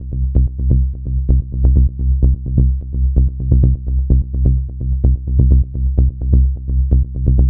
Bass Loop C 128, Melodic Techno; Restrain; Ghosthack; AC2024 - Day 12 - Melodic Techno

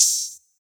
Open Hat; Modern Drum Kit; Bingo

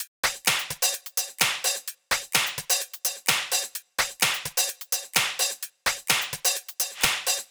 Top Drum Loop - 128 BPM; Drums; Loops; House

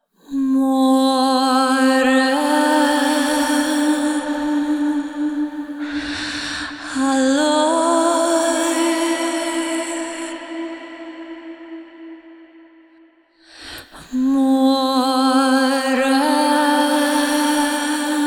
Top Line Vocal - Am 70Bpm (WET); Construction Kits; VOCALS; Ghosthack; SkyRealm

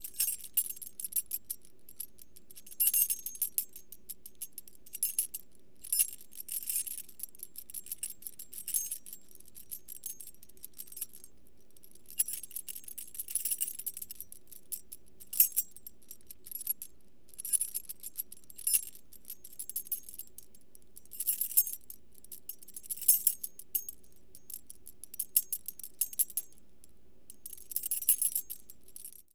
Keys Jingle Long; Vehicles; Doors & Keys

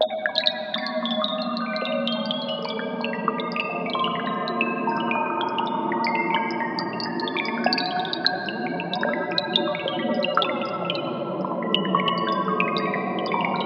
Ambience Mermaid Chimes; Riddim; One-Shots; SFX; Ghosthack; Riddim Essentials Volume 2

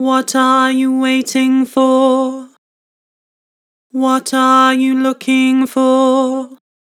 Vibrato, Vocals; What are you waiting for; Harmor Vocals

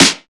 Snare, techno drum shot

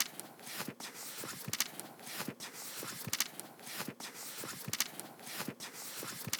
Percussion Loop, Percussion Foley, 150bpm; Dubstep; Percussion Foley Loops; Ghosthack; Dubstep Sound Arsenal

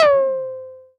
Jupiter-6 SYNTH Trap High - C; One Shots; Cymatics; Fantasy Synth Sample Pack

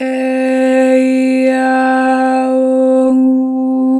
Adlib, Harmor Vocals; Pitch Flat

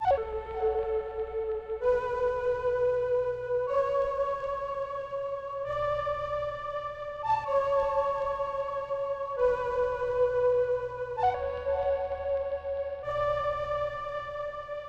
Various Melody Loop - 129 BPM F# Min Flute; Melodies; Loop Stems; Cymatics; Oracle Sample Pack; Oracle Various Melody Loop 7

